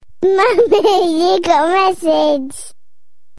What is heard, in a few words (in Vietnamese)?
Nhạc chuông tin nhắn hay